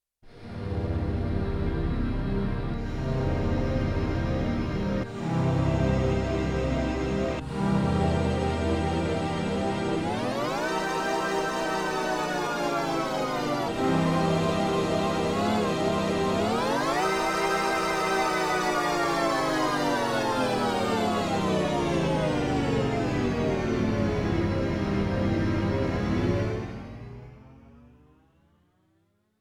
Mellotron, Strings; Yamaha TX16W; Mellotron Accident !

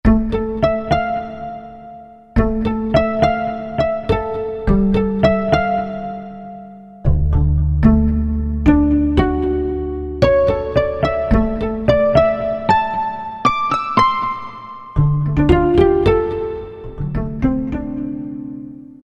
Harp Pluck, Korg 05RW

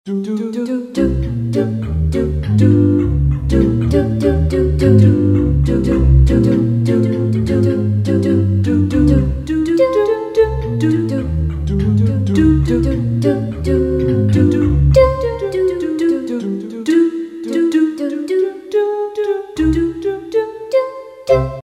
Voice Ooh, Casio CTK-601 Tones

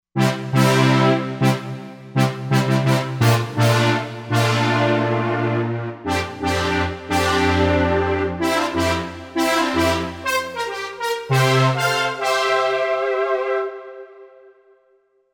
JP8000 Brass, Roland Fantom XR

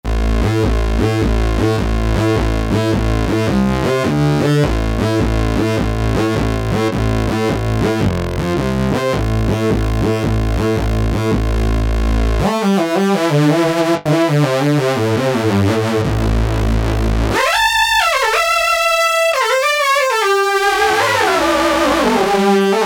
Lead, synth

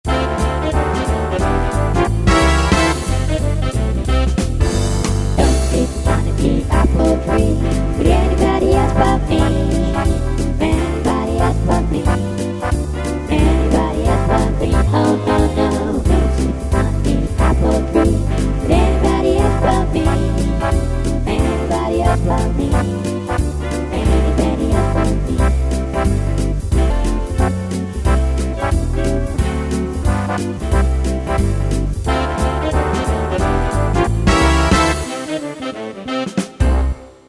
Jazz Sisters, Yamaha PSR-3000 vocal harmonies

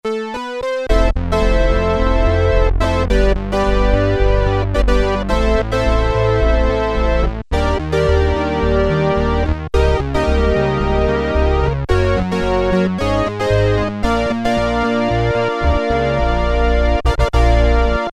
Brassy Organ, Roland Juno-106; Factory Preset

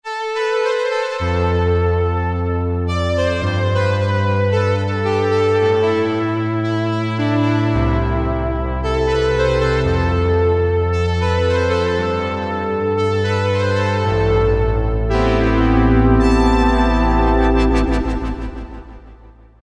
Strings; Cheetah MS6

